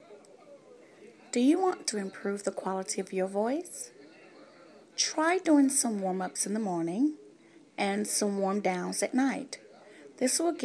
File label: warm up your voice